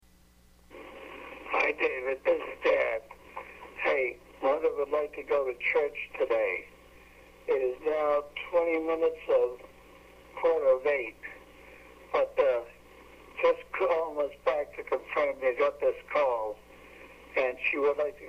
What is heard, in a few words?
phone